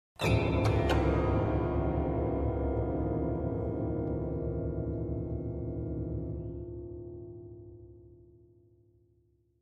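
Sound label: Grand Piano Strings; keyboards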